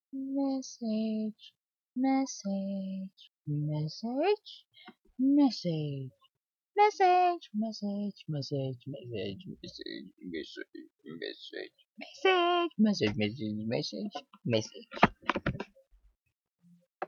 Wierd message tone

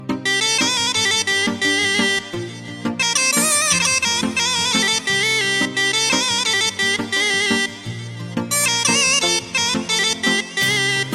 Rhythmically Sound

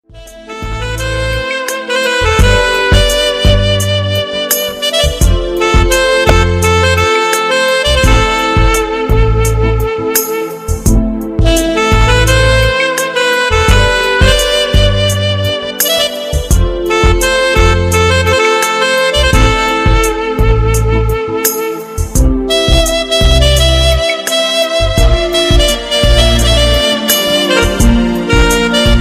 Best Flute

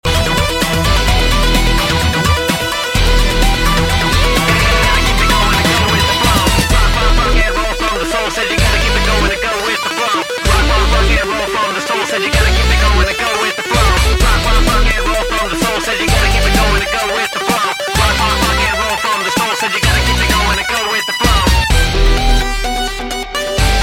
Electro Rock

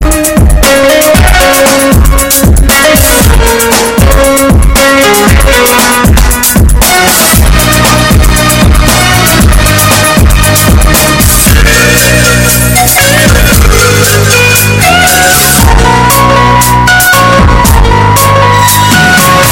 Unique Instrumental

